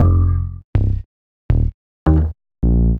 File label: Fnkdrop Bass; Sonic Foundry (Sony Creative Software); Knutrix Drum & Bass